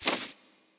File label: p atk richfan